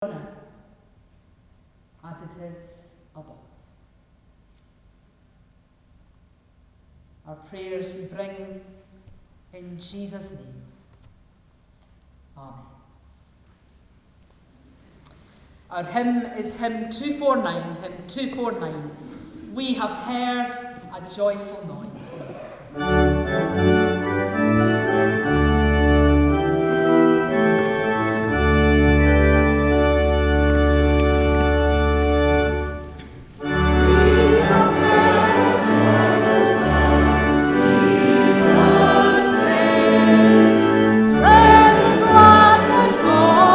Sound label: Hymn; Hymn 249; We have heard a joyful noise